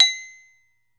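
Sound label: SARAN F#4, Metalophones, Pitched Percussion; E-MU Producer Series Vol. 7 – Old World Instruments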